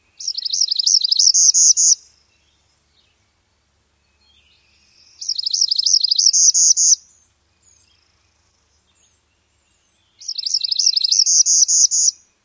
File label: dawn song